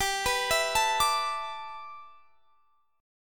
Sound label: G 6th Add 9th G, Guitar Drop D; x,22,21,21,22,22; down